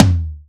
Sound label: high floor urban tom drum sample e key; tome drum sample